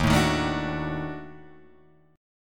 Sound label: Eb Minor Major 7th Flat 5th, down, Guitar, Drop D